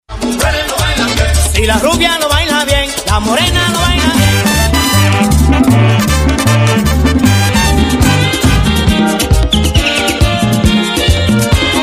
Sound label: merengue mix